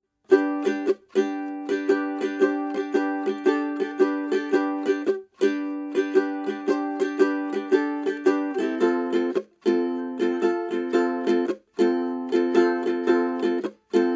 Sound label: Ukulele Sennheiser MD 441